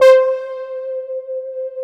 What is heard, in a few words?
SYNTH X LD, TECHNO; Pro-Rec - Synthsonic; Z1 TECHNO